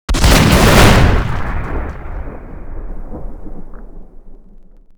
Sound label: big bang; WAR